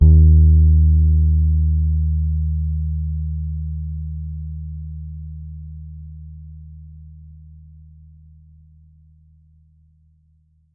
D#3 soft, Pedulla Thunderbass, Bass; Giga Samples Collection

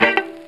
Chicken Picked 117; Mo' Phatt; Digital Sound Factory Mo' Phatt

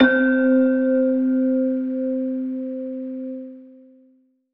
Pluck 5 - C; 99Sounds; Monosounds; Music Loops; Instrument Oneshots

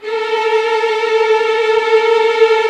HF TRLL A4, Violin; Q Up Arts; Denny Jaeger Master Violin Library; PERFORMANCE

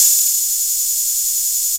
OHH, Roland JX-305

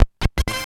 SCRATCHES

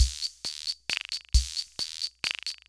Loop, sr-95; classic machines

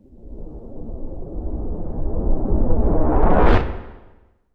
IMPACT RUSH, Cinematic Impact; M-Audio Pro Sessions; Liquid Cinema

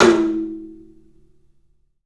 TOM, 3D Drums, CLUB TOM; 3D Sound; CLUB 08TOM

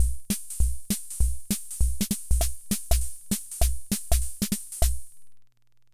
Hammond Auto-Vari 64 Loop, drum machine; Hammond Auto-Vari 64 Ableto Project; 300 Drum Machines

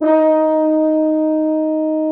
Orchestral Brass

